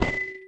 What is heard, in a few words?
explosion glass; EarthAttack